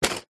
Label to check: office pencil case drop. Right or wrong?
Right